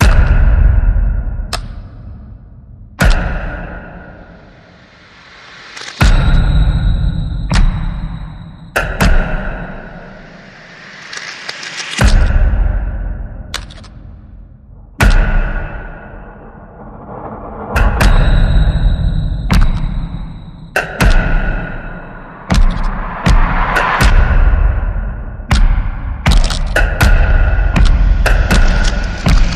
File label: Unsettled Rhythm